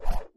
sword swoosh

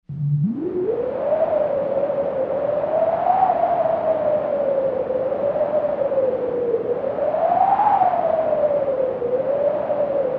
Brisk Breeze

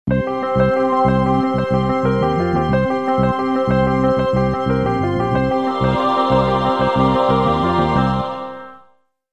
Music Logo Piano, piano

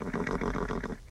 Horse General Snort

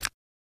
Push Button Soft, buttons

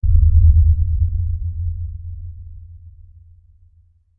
Bass Impact Low Tona; impacts

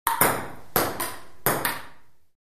Ping Pong Ball Hit

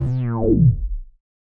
Interface Screen, Sci-Fi Space; Interface Screen 3

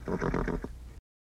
Horse General Snort

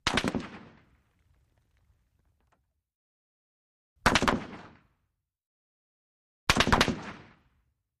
AK47 Short Burst Impa

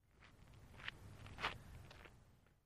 Basball FS Mound, baseball; baseball fx & games

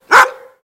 Dog Bark Generic Clos

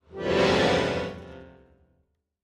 Accordion Bass Keys

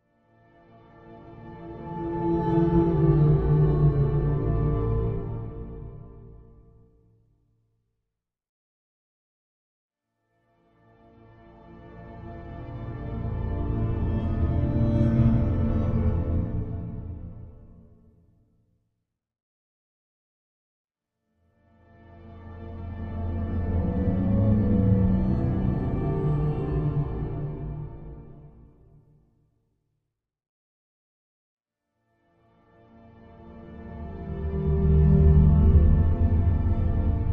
Music Eerie Soft Orchestral

